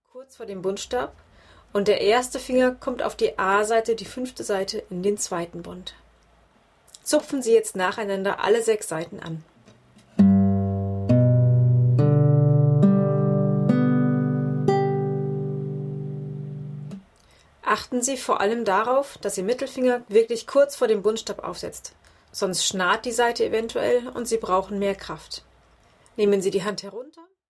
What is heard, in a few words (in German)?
g dur andere griffweisen